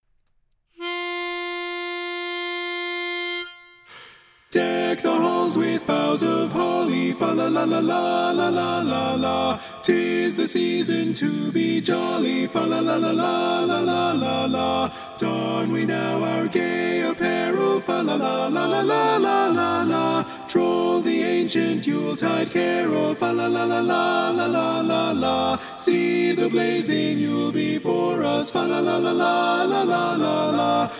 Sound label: Deck the Halls - tenor mono; Christmas